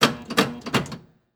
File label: Technology Electronic Pinball Machine Retro Machine Buttons